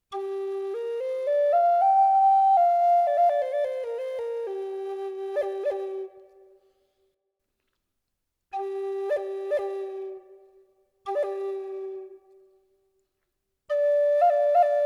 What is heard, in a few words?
G, 7/8ths Bore